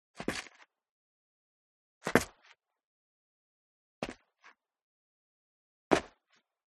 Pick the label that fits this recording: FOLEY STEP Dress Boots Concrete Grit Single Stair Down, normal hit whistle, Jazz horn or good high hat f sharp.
FOLEY STEP Dress Boots Concrete Grit Single Stair Down